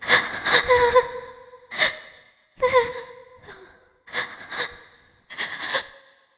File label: Crying; zm dust MD